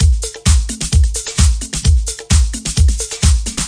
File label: uninspired house, 130 bpm, drum loops and breaks